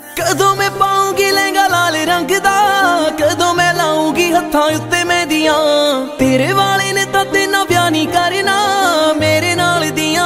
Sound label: new punjabi ringtone